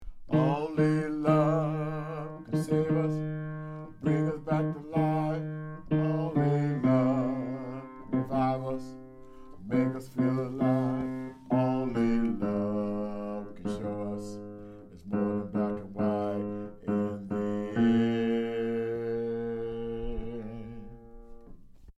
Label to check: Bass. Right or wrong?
Right